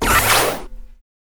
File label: rocket; CosmicRage; games